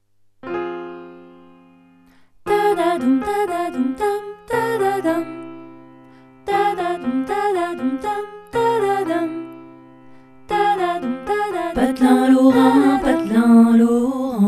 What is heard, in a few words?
tutti